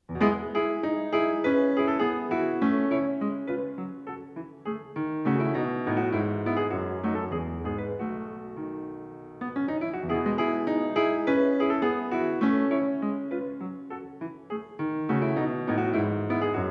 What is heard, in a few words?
Sonatines for Piano, Sonatina in E Major Allegro; Sonatina No.2; Sibelius; Op. 67